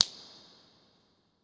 snap wet; InVision Interactive; Percussion Slam

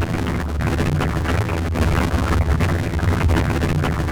FM FX, synth; AKAI S-Series CD-ROM Sound Library VOL-6

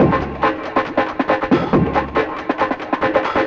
FXBEAT

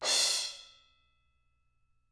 Drums, ZILD MALLET

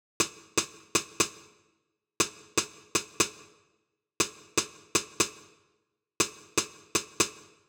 Little Snare, 120bpm D; Construction Kits; Zero-G; Nu Disco; Happy People

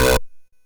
Drums, Hits; DCM8

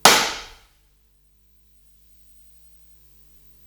Hihat, Psychedelic Drums

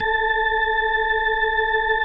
A4F, B3, Vintage Keyboards

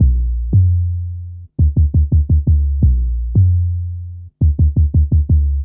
Hammer Bass, Drum & Bass; Fluid Dynamics

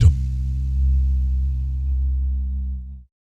BS DUMM, Vocal Planet Jazz & FX; ILIO; BASS DUMMS

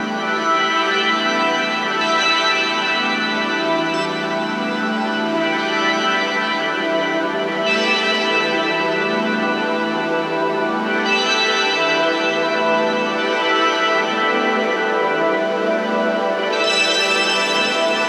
pad loop 150 Emin; Virtual Riot; Synth Loops; churchofpads

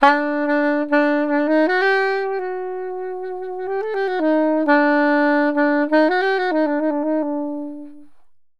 SAX, Balkanish Traditions

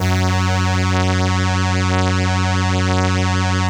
ELKA, String Machines; ELKA RHAP

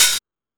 Techno G, Drum Machines, EMU XL-7

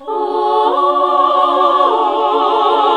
SING, CLASSIC VOX1; Best Service; Hallelujah

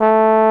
TBONE A3, TROMBONES; Greytsounds VOL-1

